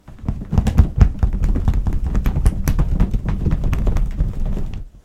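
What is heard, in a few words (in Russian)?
встряхивание полых коробок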